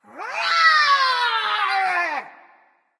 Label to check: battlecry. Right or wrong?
Right